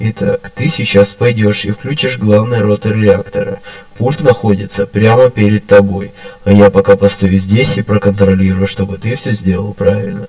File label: teleport; helmet